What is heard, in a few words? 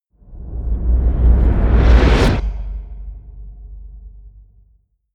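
brutal short, drones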